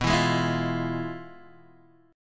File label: F Minor Major 7th Flat 5th, B